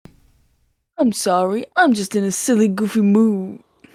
goofy mood; Melania; goofy ahh soundboard

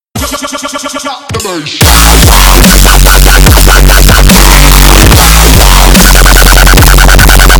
drop the bass ear rape

